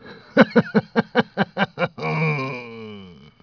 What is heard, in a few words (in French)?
rire